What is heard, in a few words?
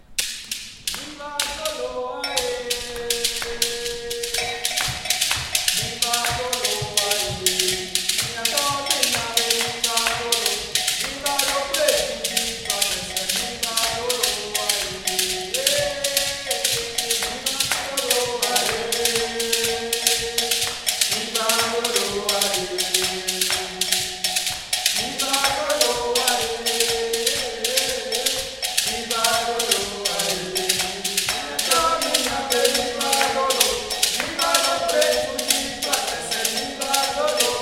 drum ensemble